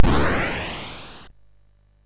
sid MOS6581R3; Gremlins 2-The New Batch

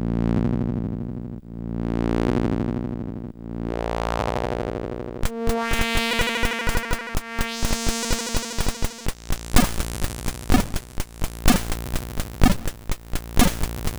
sid MOS6581R4

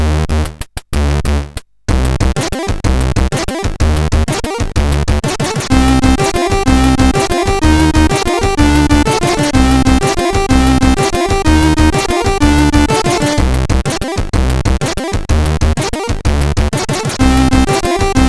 Gravitrix, CSG8580R5; sid

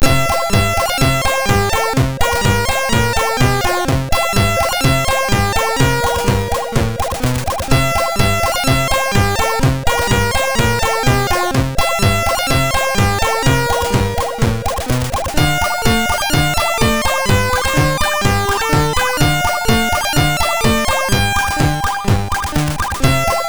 sid MOS6581R2; Fiendish Freddys Big Top O Fun

